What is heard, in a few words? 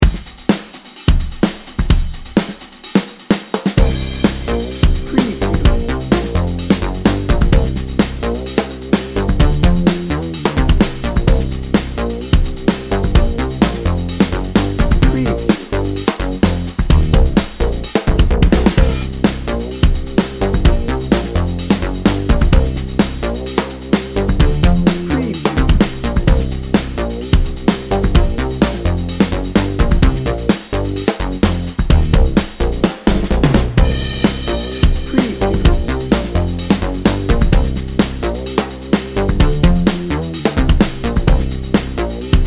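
funky bounce drum and bass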